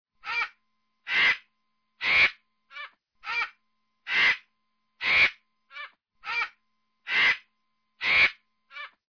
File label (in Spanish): buitre